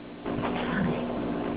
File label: EVP